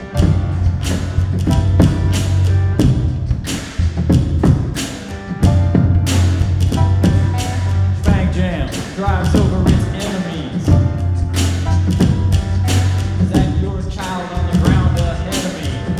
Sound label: beatbox